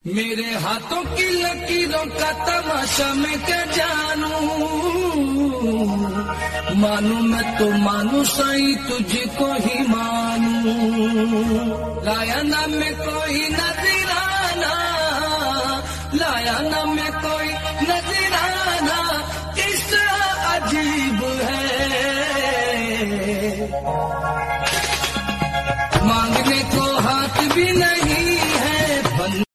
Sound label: Sai Baba Bhajan Ringtone